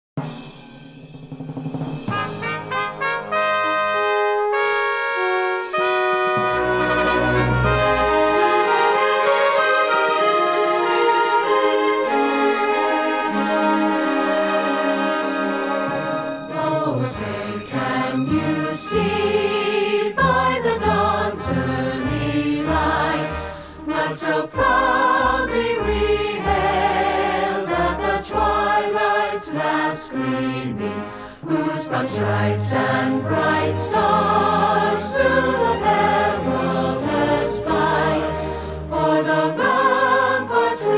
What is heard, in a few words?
national anthem